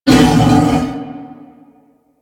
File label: Wolf Attack; Animals; DE; Warframe; Quests; Duviri